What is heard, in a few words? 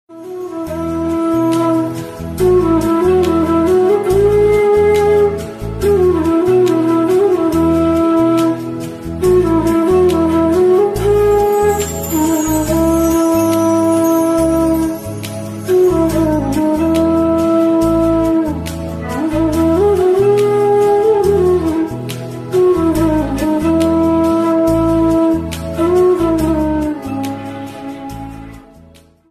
Flute